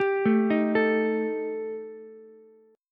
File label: D sharp Major Flat 5th, Ukulele; arpeggiated; D#Mb5; 12,9,11,12; JGuitar